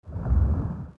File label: bubbles, underwater; Soldier Of Fortune II Double Helix; XBOX; ambience